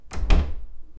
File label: sound of a modern interio; interior